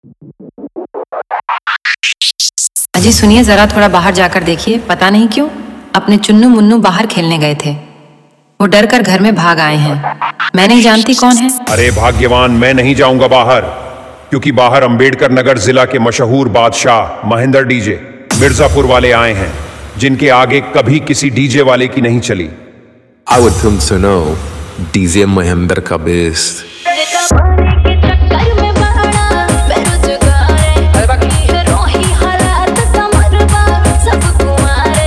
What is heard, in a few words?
Bhojpuri Remix Song